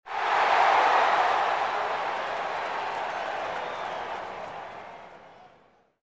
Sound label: crowd; csgo